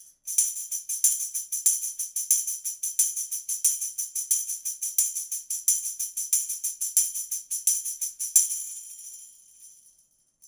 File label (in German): Schellenkranz; tambourine; mk-105; Oktava